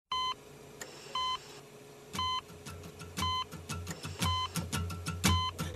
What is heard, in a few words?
Respirator